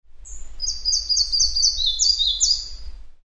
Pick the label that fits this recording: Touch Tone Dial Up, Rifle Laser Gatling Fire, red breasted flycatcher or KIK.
red breasted flycatcher